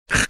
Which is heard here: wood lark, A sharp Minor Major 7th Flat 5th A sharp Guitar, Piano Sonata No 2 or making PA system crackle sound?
making PA system crackle sound